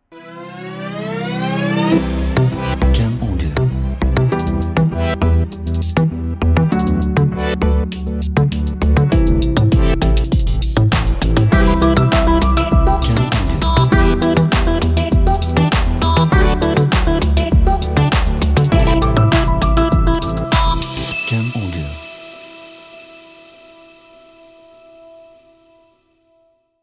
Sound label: Future Bass Promo